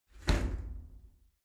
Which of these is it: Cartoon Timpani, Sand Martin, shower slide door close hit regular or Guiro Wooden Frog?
shower slide door close hit regular